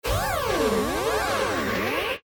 Warble Blast

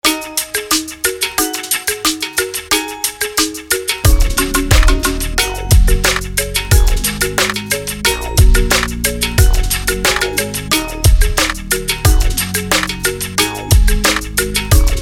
Oriental Beat

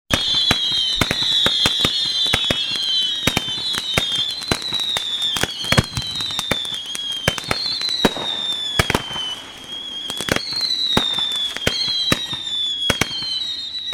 Fireworks; Soundzabound Volume 3 Disc 3

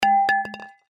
bat drop

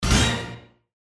Radio Musical

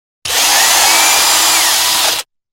Drill; Soundzabound

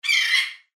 bird squawk

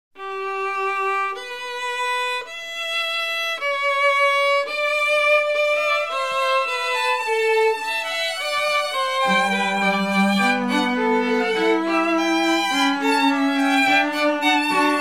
String Quartet in G; Mozart; Soundzabound

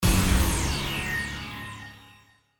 Blast Digital

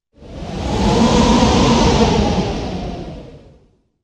Wind; Soundzabound

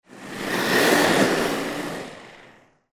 skateboard

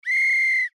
referee whistle

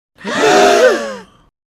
Huh (Inhale); Soundzabound Volume 3 Disc 3

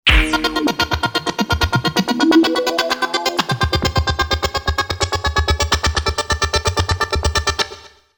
Elevate, long; Soundzabound

